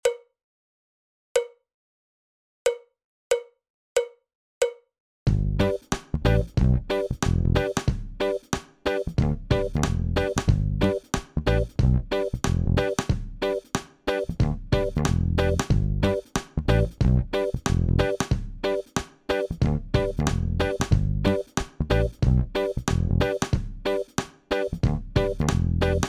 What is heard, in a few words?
solo